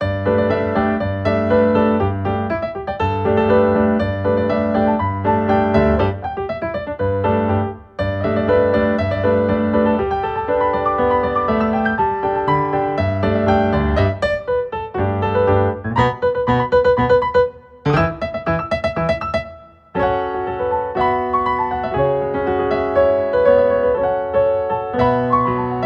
Piano arrange; Shanghai I Melody-Reflection

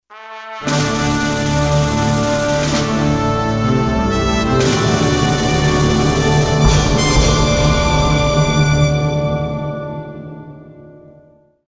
promotion; planetside

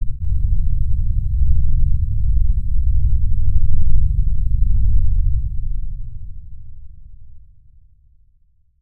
Earthquake; snes; Jungle Wars 2

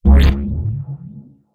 Reticle On; Ruins

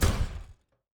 Footstep Robot Large

